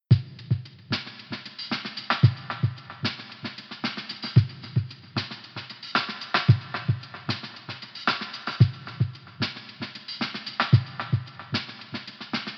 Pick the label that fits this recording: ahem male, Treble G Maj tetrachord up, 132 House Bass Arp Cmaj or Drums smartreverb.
Drums smartreverb